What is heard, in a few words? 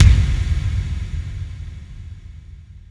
BD Reverb FX, Verb Kicks; VEC1